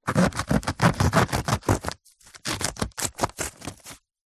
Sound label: foley paper sheet cut with knife; zapsplat